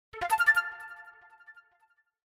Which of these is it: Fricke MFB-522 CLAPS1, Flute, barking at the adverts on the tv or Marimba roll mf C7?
Flute